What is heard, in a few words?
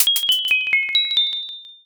cracker